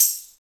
TAMB, ETHNIC PERC; Roland - LA Composer vol. 1

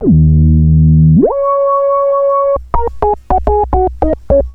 RI SYN, Roots Funk; USB Soundscan vol.41; AKAI

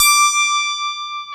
PIA DX E-PIANOS; Sound & Vision; Gigapack I CD 2; PIA DX-Pianos

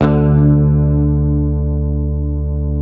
Hamer 12, SUS, D2, Bass; Sampleheads; Will Lee Bass Library

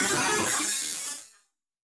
Malfo Syn, malfunctioning synth

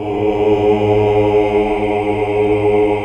AAH; Best Service Gigapack 1

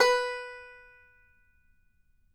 banjo B4, banjo notes; Sampleheads - Whole Lotta Country 1 & 2

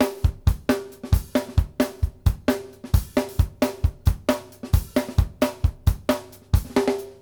BASIC D L, Drumworks

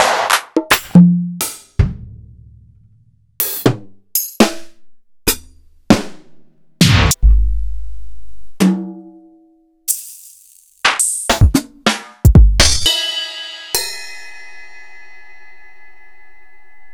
EMU PX-7; m8

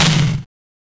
Power Tom; Genesis

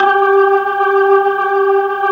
G4, Keyboards Old School; USB Soundscan vol.09; AKAI

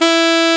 SAX A. MF E, Alto Short; Roland LCDP07 Super Sax

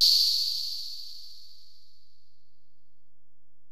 HiHat, Alesis DM-PRO

